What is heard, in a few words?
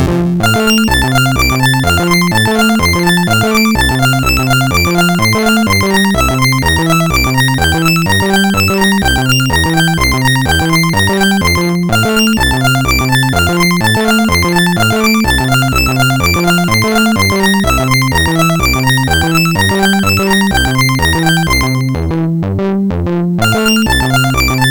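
Pac Mann, MOS 6581 SID chip music, games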